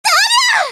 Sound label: molotov; csgo